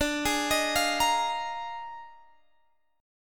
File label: Eb Major 7th Suspended 2nd Suspended 4th D